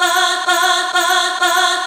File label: House Loops, Vocal Loops House 128BPM; Vengeance Samples